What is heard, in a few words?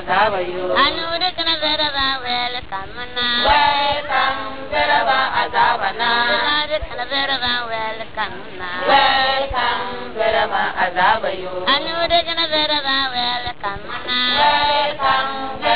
Women; Navrongo